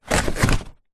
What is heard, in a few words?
foam packaging into cardboard box